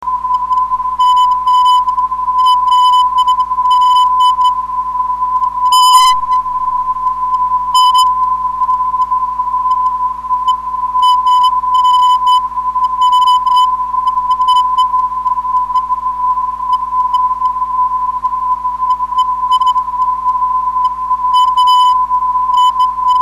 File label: prd 432kHz, ndb